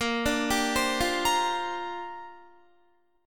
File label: Bb 6th Add 9th Bb Guitar; Bb6add9 arpeggiated